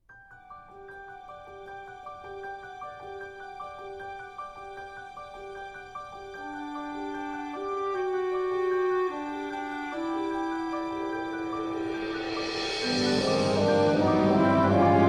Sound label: Orchestra Choir Tracks; Scene Change To Shepherds Hill